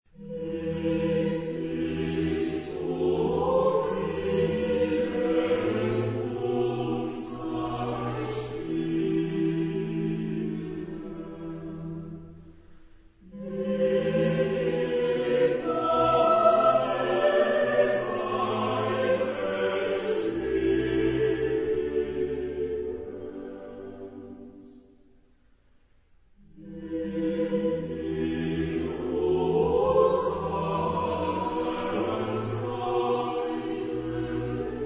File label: Corales, chorus; Bach; BWV315; Gib dich zufrieden und sei stille